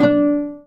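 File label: cello pizzicato A; AKAI S-Series CD-ROM Sound Library VOL-2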